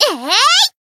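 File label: Voice attack, Japanese; thillelille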